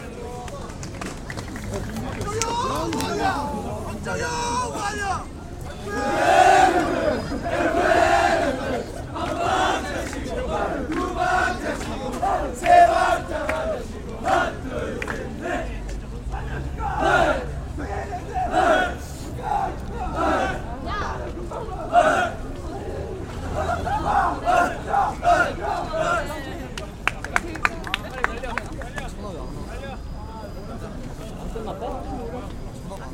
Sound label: male ritual in sinchon seoul